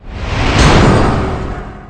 testimony

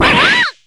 damage; mai natsume

